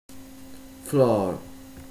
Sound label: floor, spain